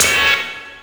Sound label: skreech, stabs